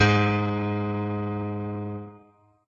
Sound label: piano